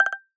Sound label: Receiver Connect; iOS Sounds